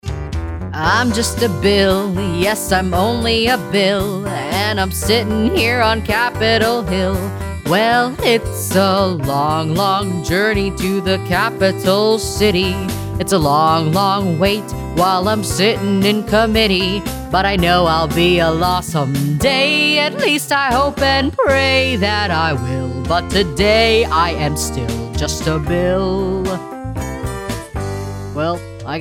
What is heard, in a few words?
Singing